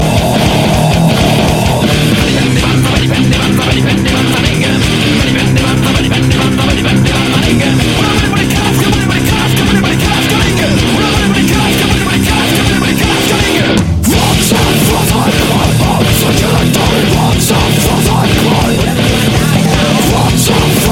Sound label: Note 12 Infinix Ringtone